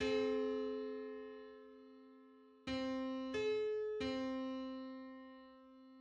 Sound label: Two-hundred-ninth harmonic on C; 848.83 cents